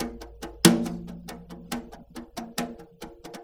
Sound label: Earth Loop, Drum Tools, Laid Back